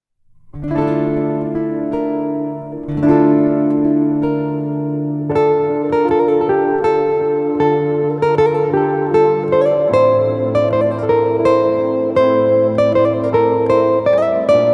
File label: Bikalam Guitar; بی کلام; (پیانو و گیتار)